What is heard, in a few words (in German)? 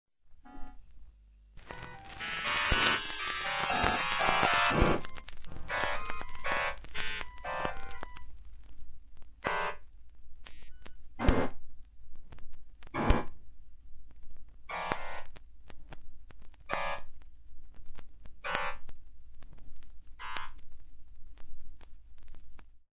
Schallplatte, orchester; ablinger